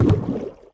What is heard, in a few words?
Monkey Footsteps Water; BTD6; Legends